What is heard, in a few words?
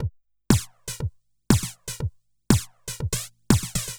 House Drum No HH Flanger